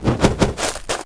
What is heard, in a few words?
Move Sound, Familiar; Heroes3